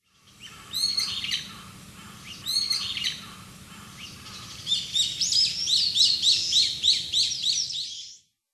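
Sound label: Brown-eared Bulbul, kokubunji; hiyodori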